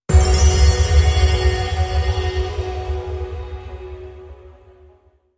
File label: Sting